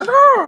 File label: haw haw